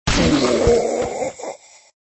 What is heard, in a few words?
Zombie Agony; Extra Lives